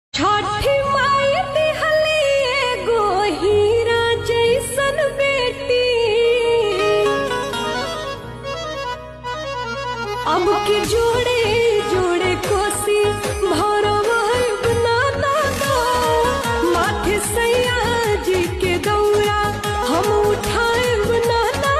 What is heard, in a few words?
Ringtone